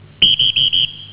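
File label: whistle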